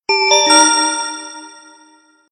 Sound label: Bright, ringtones; Sony Ericsson; K999 Prototype